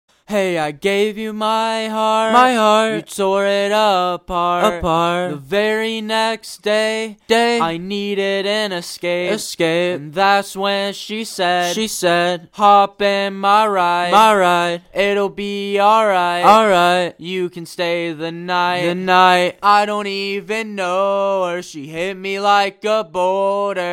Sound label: EDM pop 102 FM